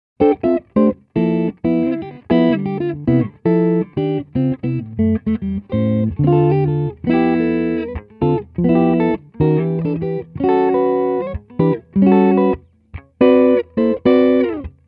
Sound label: Hollow T neck, pickups; Satin